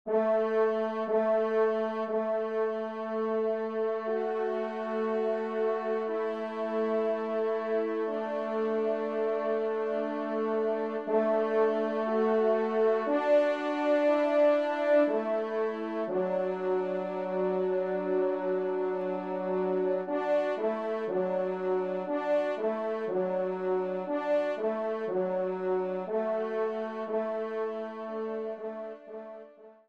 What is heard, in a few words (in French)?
Cloches du Village, trompe; Emile Rolle